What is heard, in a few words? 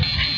no time; usa